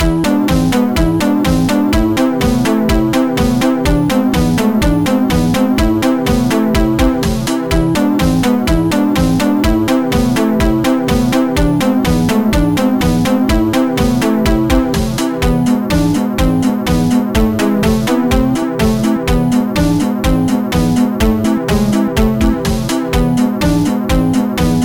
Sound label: russian ambience; MED; Ash